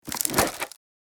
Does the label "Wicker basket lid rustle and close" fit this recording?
Yes